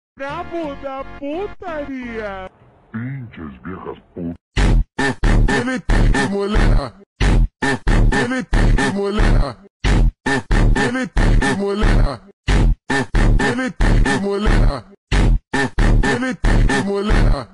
Phonk Slowed

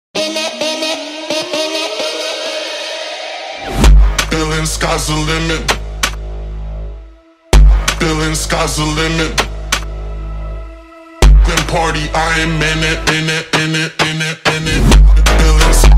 Phonk Brazilian Phonk

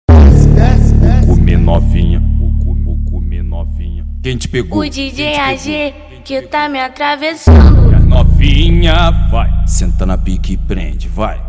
Phonk

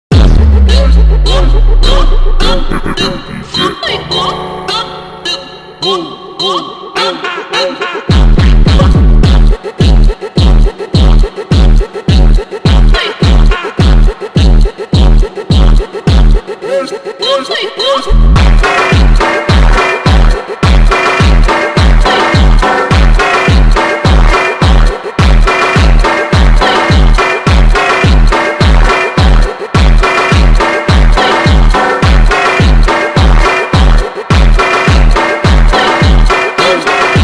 Phonk

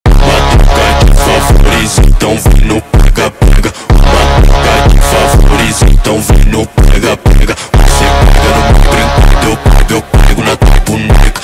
Automotivo

